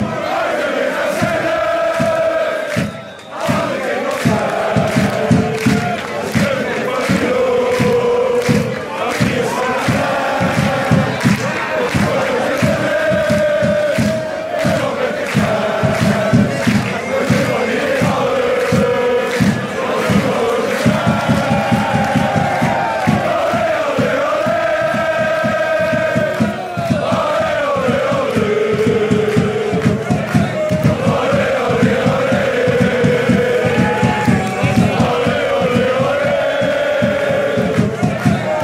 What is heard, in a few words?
allez allez allez